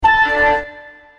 Sonnerie gare

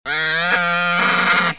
Canard, Animaux; bruitage

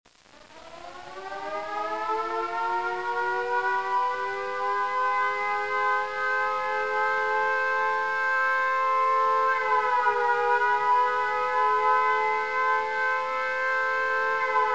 Sirene

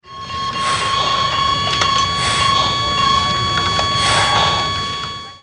Ambiance hopital; bruitage

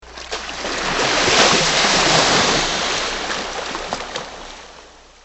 Vagues; bruitage; Liquides

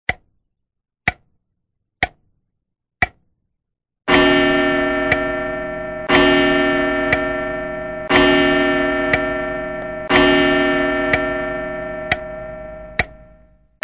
Tic tac gong; bruitage; Electro-menager

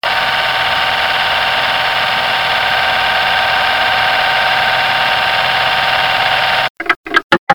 Projecteur arrêt

